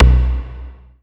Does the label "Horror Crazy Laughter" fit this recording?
No